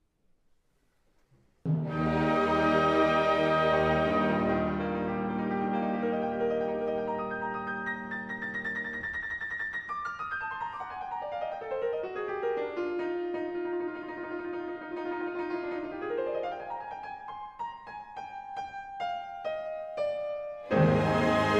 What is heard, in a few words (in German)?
Beethoven Klavier Nr.5